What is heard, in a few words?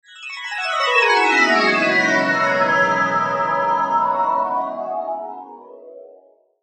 Logo Sweeper Flange Descend Twinkle; Sonic Logo; Blastwave